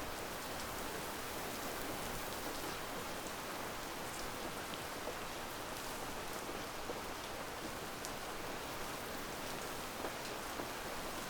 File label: outdoor mountain road rain mid